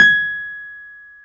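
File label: piano, Wurly, Keys of the 60s and 70s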